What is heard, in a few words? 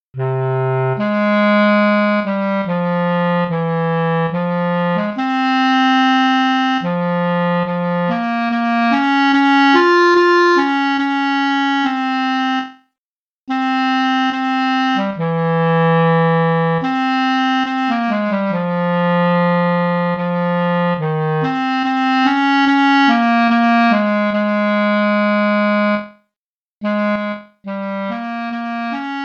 slow; ALTO CLARINET Lyrical; Region 23 Middle School Etudes for 2026-27 (Year C)